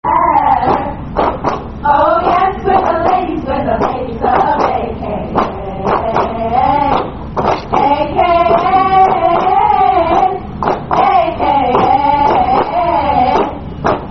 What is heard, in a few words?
Sorority Song; Intro Of Aka Probate